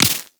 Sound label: Laser Impact Flesh; Warframe; Bullet Impacts